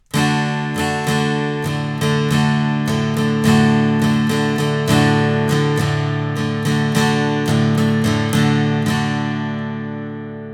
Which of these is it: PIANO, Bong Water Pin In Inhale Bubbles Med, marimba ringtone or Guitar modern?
Guitar modern